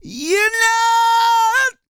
REGGAE, Vocal Planet World Voices

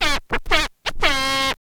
scratch kit, vinyl; Masterbits; Vinyl Attack

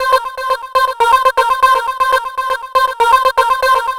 Techno Synth

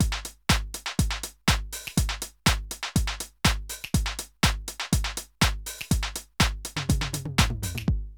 drum loops, House & Garage; Zero-G Groove Construction (1993)

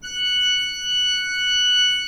pipe organ, 3-D Audio; E-MU Producer Series Vol. 5 – 3-D Audio Collection; 3D Organs; 3D Pipes Dr Joyce

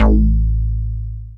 attack, BASS, Techno Trance; Techno Trance Essentials

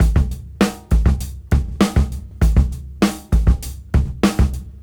Break 99 BPM; AMG; Keith LeBlanc Phat Butt Jams

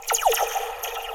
Sci Fi; Mo' Phatt; Digital Sound Factory Mo' Phatt

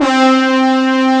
BRASS, MIX BRS; AKAI S-Series CD-ROM Sound Library VOL-2

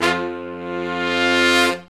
BRS LONG, R&R Horns, Sect; Roland L-CDX-03

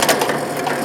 WRAPPING; Greytsounds; INDUSTRIALFX

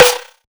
snare synths

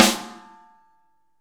SN ambient; Best Service; Real Mega Drums VOL-1

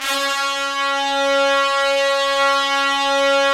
Trumpets; Roland - LA Composer vol. 4

